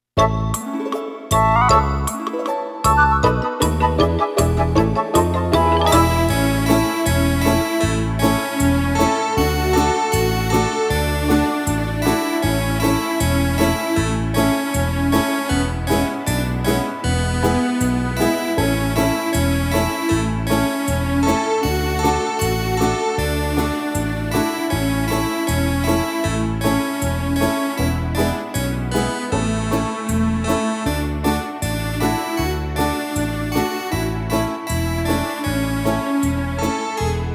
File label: Czech Folk Dance; Karlstejn Castle